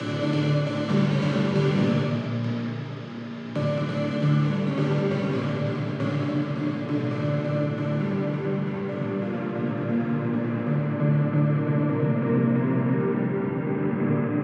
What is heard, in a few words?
bass; Wet aer bass NORMAL